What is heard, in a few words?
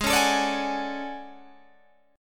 A Major 13th, guitar